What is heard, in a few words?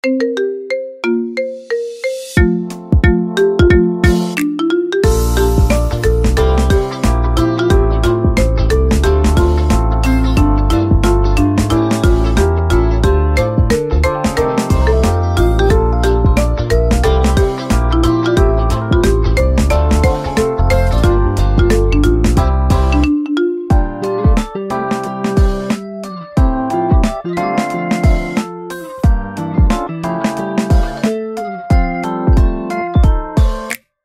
Marimba